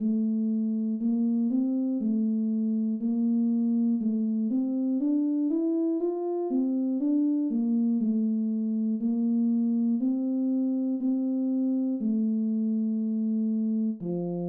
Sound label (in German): tenor